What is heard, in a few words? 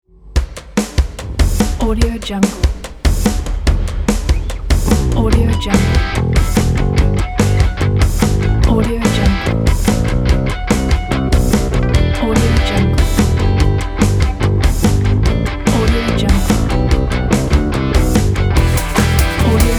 uptempo indie rock